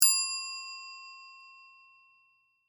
Bell Ring Idea, Music, Bell, Ring; Bells; Musical

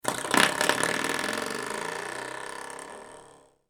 Foley Door Stopper Movement Spring; Miscellaneous Doors

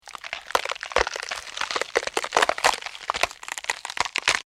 Flesh Rip Tear, Bone, Crack; Horror; Blood And Guts; BlastWave

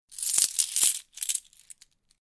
Coin Rattle; Long, continuous rummaging of coins in hand.; Money Sound Effects; Lynne Music

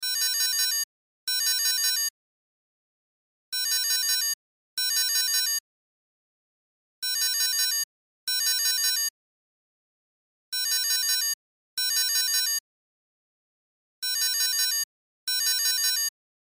Cell Ringtone; Loop; Cell Phones; Blastwave

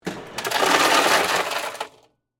Ice Machine Dispense, Hotel; Miscellaneous Bar

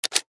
Technology Floppy Disc Eject; Computers; Electronics

